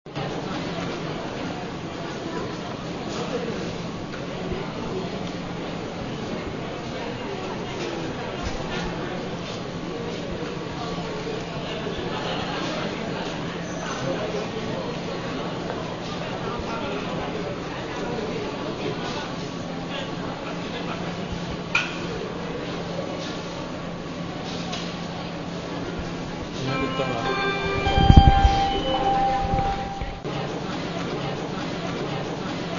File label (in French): ambiance fond gare; 32 secondes; mixage audio